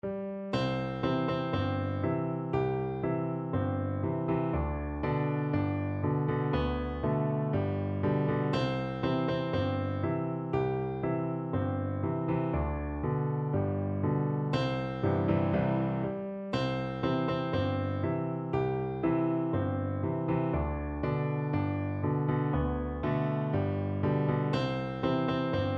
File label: wheels on the bus piano